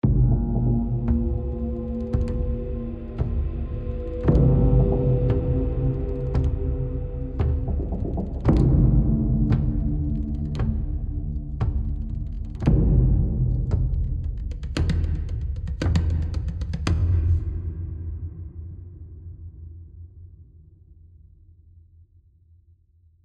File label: Tension High Chunk